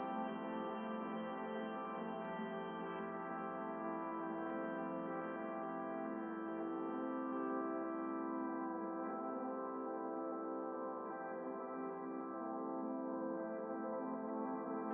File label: glitchscape; 0°N 0°W